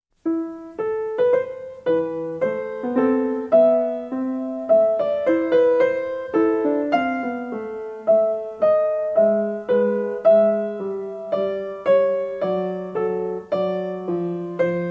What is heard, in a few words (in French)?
menuet